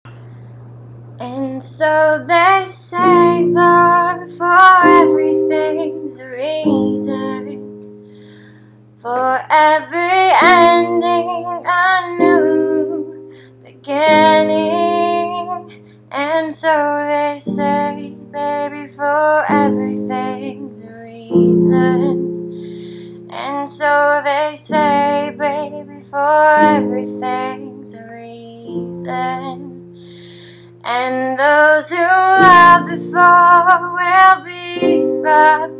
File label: yay